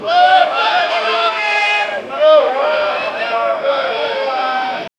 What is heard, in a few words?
tense medieval crowd murm, market